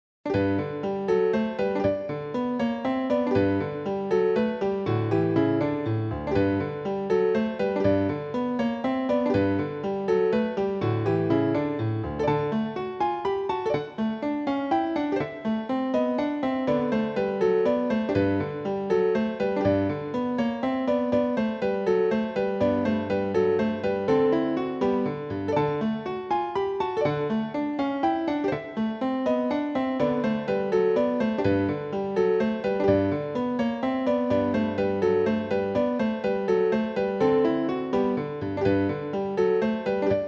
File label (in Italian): Abbozzo Per Pianoforte; Kafka; Ludwig van Beethoven